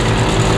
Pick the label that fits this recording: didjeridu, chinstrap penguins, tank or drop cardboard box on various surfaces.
tank